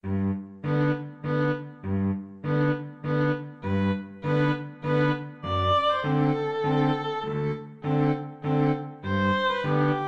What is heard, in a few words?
2 violins cello